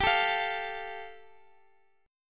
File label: G 7th Suspended 2nd, G, Guitar, DADGAD; down